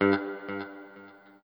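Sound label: noodle short, guitar; Quantum Leap; 90's Sample CDs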